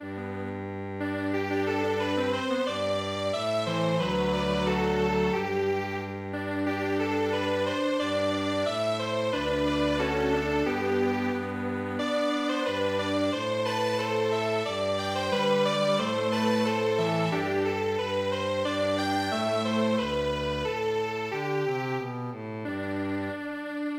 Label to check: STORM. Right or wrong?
Wrong